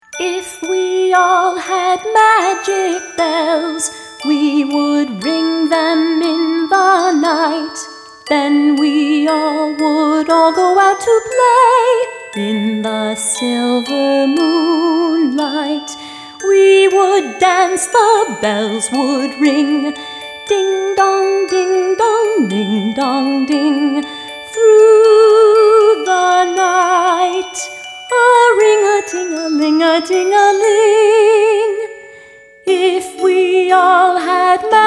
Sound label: MAGIC BELLS; for kids only